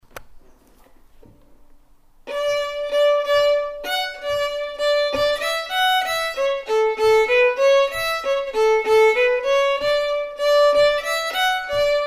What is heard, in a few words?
Jig, fiddle; New Rigged Ship